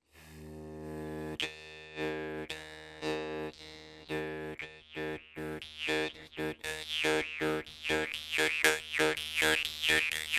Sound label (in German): maultrommel; instrumentenmuseum